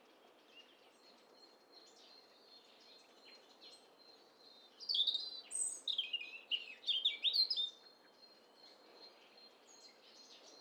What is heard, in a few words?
STEREO BIRD COCKTAIL PARTY